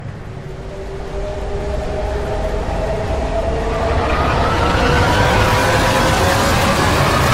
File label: slowmo; lastshot